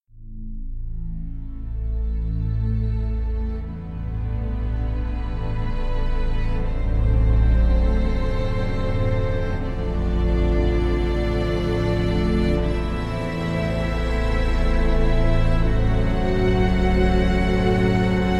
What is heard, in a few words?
Piano